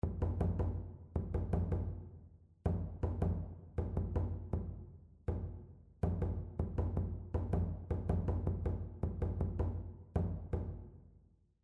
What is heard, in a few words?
rhythmic error detection; ear training